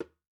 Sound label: WALK DRUM, African Drums